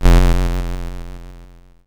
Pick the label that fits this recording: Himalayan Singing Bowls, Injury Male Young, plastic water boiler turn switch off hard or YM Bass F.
YM Bass F